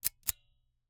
Icecream Scoop, metal, mechanical, teeth, gear, squeeze, release; Eiravaein Works; Vaeyan IV